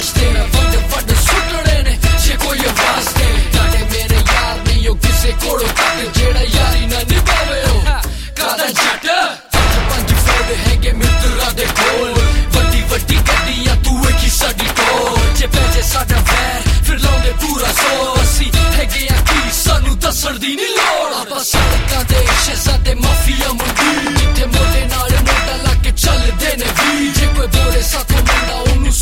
Rap

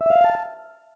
Piano, notifications; Mi Mix 2S Stock Ringtones

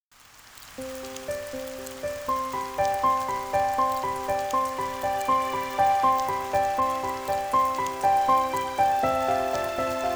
alarms; OnePlus 6 Ringtones

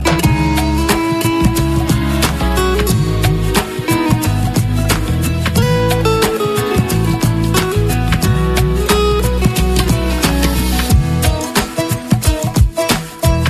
love guitar tone; Love Tones Mp3 Ringtones

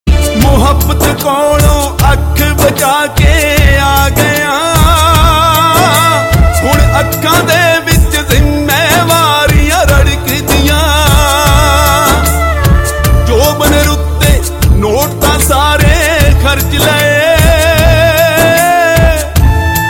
Punjabi New Sad Tones

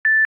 Beep Once, notifications; Galaxy S9

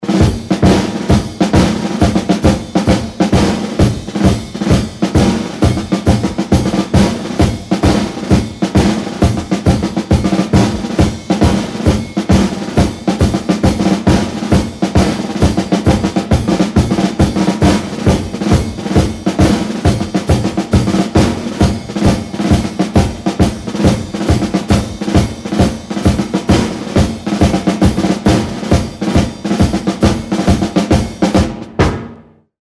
Drums Pattern March; Funny instrument Ringtones

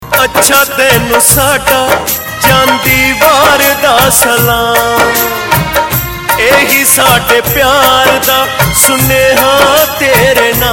Punjabi Sad Ringtone

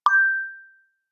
Mallet, notifications; Google Pixel 3

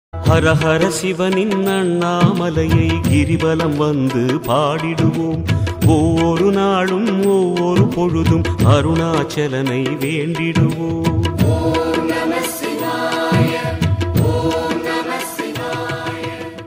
om nama shivaya; Navratri Special

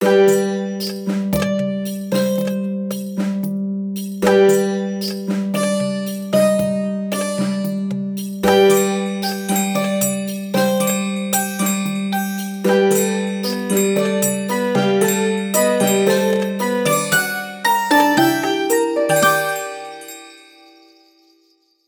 Full of wonder, alarms; Google Pixel 3

